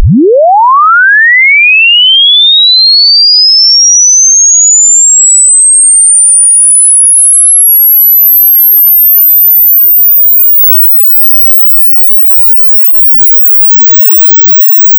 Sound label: chirp